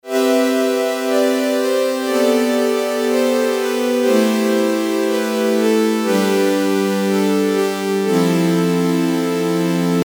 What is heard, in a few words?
Env Syn Str